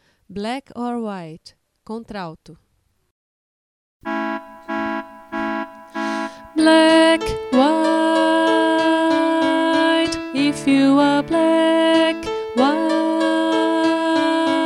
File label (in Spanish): naipe contralto; 4 VOZES